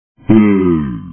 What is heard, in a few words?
bowl; vapesquared